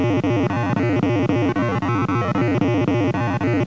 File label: COPTER; arcade